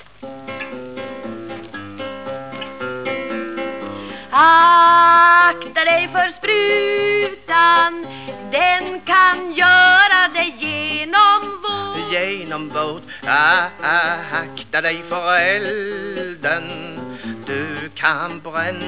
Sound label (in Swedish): duett